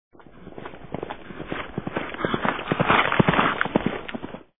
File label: gallop